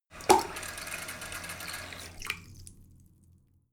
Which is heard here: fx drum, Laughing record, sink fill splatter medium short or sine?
sink fill splatter medium short